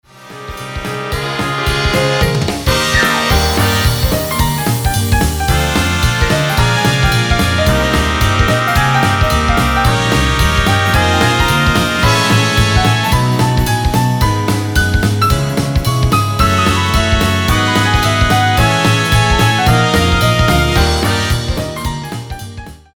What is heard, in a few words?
brass